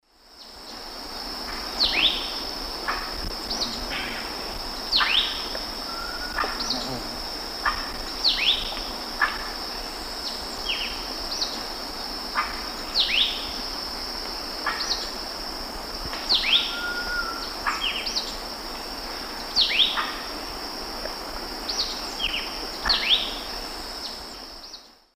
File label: Euphonia chrysopasta, calls; S. America; Eastern Lowlands